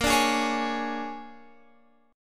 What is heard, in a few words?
Bb 7th Sharp 9th Bb, Guitar Open F; Bb7#9 strummed; x,17,14,15,16,15